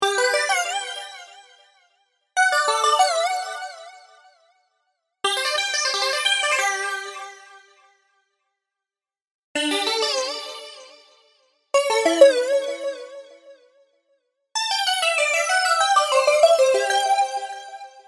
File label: Synth Same Melo; Silex